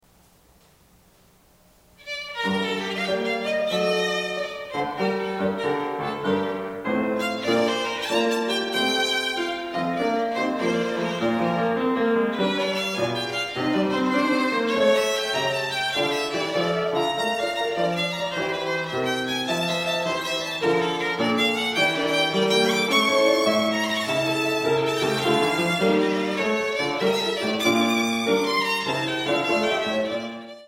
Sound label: Violin Sonata in G Minor Allegro moderato LIVE; Tartini